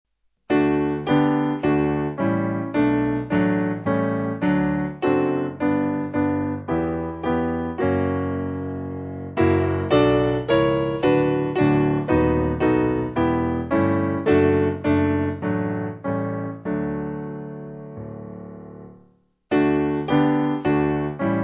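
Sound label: Piano, Music Backing Files for Churches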